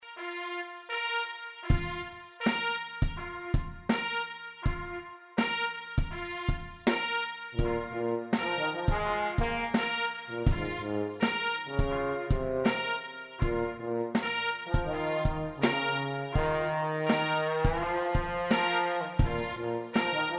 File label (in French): trompette trombone tuba